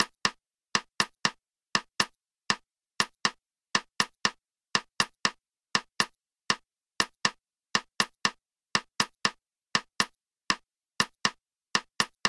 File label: yambu matanzas guagua